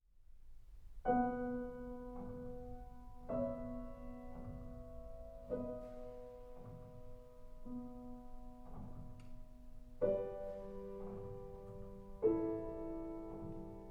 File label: Piano Hope